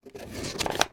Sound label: card chocolate filled advent calender door open; foley